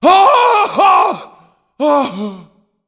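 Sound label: zombie infection; zm mix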